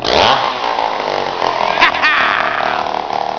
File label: chainsaw